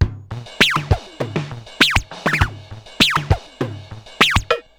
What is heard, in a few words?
Moog Freqbox RX17 (100BPM), cyborg beats; musicradar